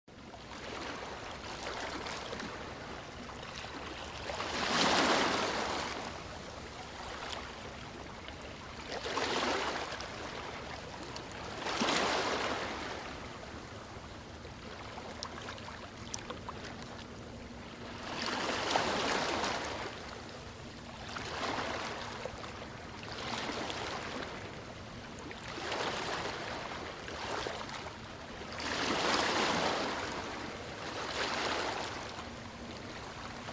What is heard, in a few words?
summer tropical beach sunset with music ocean waves